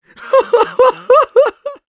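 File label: laugh happy, Russian; Scout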